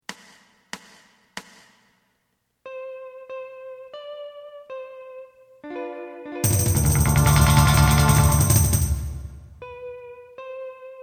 twist lead

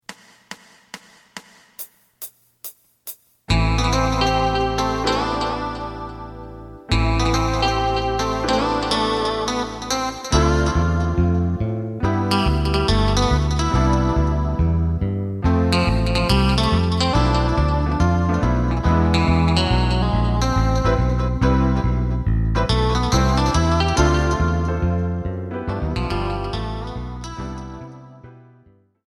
drum

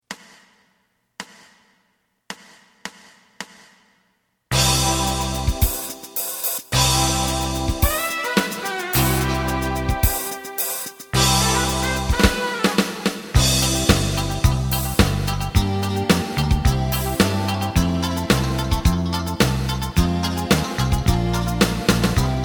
lead

